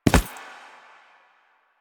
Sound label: Step Default, Footsteps; Warframe; Lasrian; Lasrian Soldiers; Foley; Lasrian Butcher